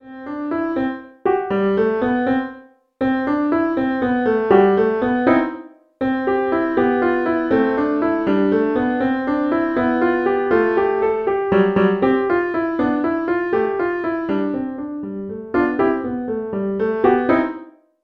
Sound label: elementary piano solo; This elementary piano solo is mainly a comical piece of piano fun for the clown in us all.